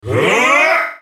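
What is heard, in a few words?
spellcast effort; Jayce Sentinel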